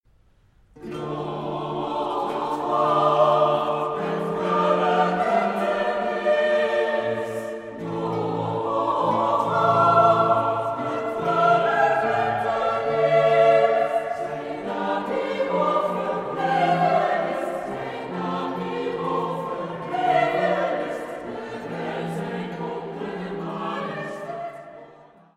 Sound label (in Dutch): Motet; Psalm 148 5; “Looft looft met waar’ erkentenis”